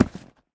Footstep; smite; raijin

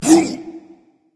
grunt hit berserk; cuchulainn

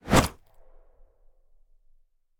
swing; smite; god odin

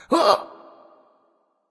Minion Grunt; smite; kumbhakarna

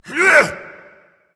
grunt attack berserk; cuchulainn

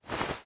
footstep cloth; guanyu; smite; hades

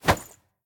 mvt punch; smite; gilgamesh

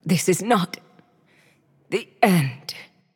death; smite; nox

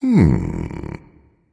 thinking, voice; Smite; ymir skin9

